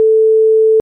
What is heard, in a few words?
sine rev f440hz